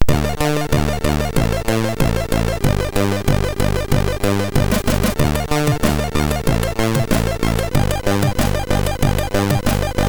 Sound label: Intro sid MOS6581R3